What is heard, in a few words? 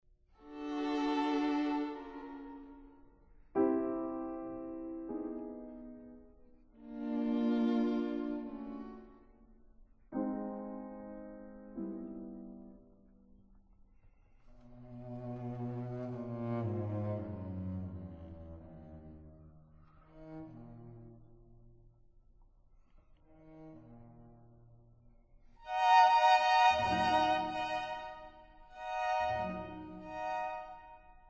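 piano quintet; rontgen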